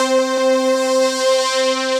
Antenna Lead, Synth Lead; Ableton; Factory Packs; Vibrations; ModeAudio

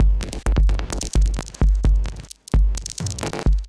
Onomatopoeia Kit groove 130bpm; MIDI Clips; 122 - 130 bpm; Ableton; Factory Packs; Glitch and Wash

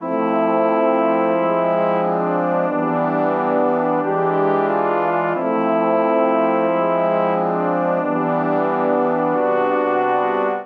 Phrase Wonky Chills C Major 90 bpm, Brass Quartet; MIDI Clips; Ableton; Spitfire Audio

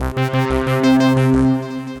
Bounce Plush Arp, melodic arps; Ableton; Mood Reel

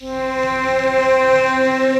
Rusty Dual Cello; Instruments; Distressed Strings; Ableton; Mood Reel

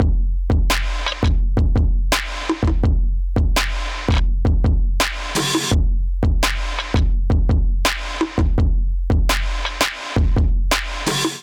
Kit groove 84 bpm; Crackin Kit groove 01 84 bpm; Ableton; Factory Packs; Drum Essentials

